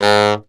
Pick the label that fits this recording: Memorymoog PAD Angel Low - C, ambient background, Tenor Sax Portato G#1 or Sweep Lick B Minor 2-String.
Tenor Sax Portato G#1